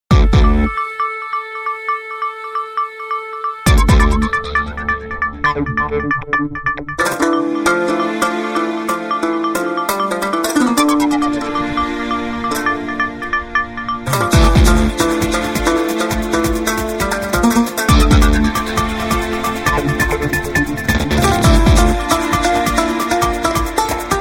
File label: Funky Arabia, ringtones; Micromax; Bolt-Warrior-1-Plus-Q4101